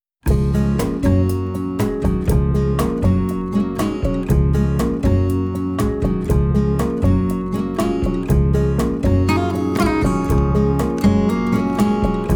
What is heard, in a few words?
Cafe Latino